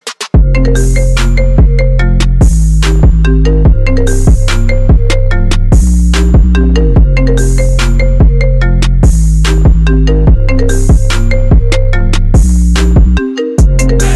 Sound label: Trap Remix